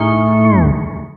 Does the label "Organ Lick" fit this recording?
Yes